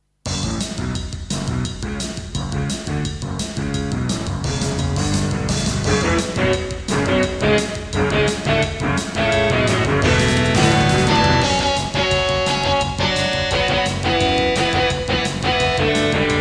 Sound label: Creative Sound Blaster AWE64 Gold; Duke Nukem 3D